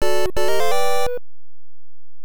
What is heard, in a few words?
Challenging Stage Start; NES; Galaga